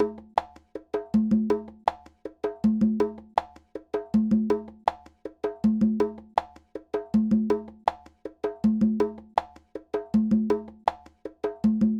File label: Conga, Latin Percussion